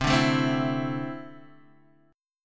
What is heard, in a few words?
D 7th Suspended 2nd, chord